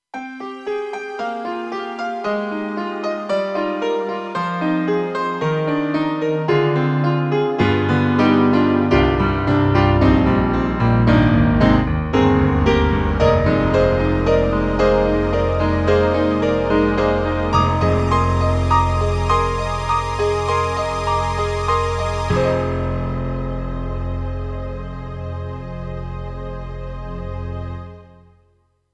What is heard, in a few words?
Piano and Ana Strings; KORG EXB-PCM08; TRITON-Rack